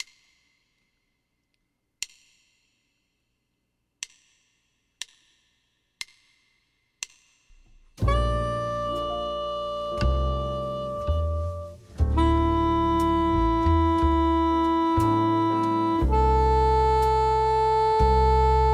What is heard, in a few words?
Bb Soprano; First Steps